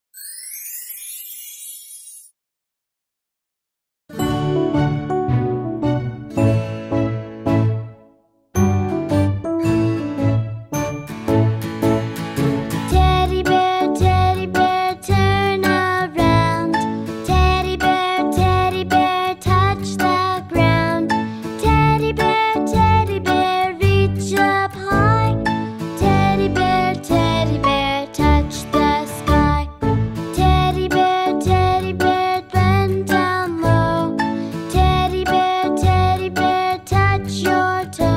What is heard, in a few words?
lullaby